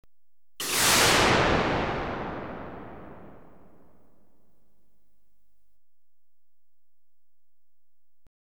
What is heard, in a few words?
Descending Crash, Electronically Generated Sounds; BBC Sound Effects Library